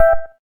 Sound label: gayageum zero, keytone